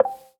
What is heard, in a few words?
Dropdown Menu